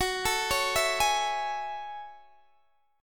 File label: Gb Minor 9th, Guitar DADGAD